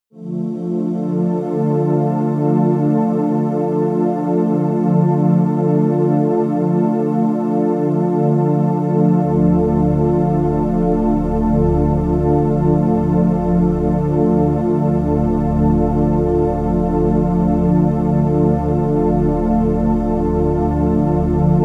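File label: angel air, pads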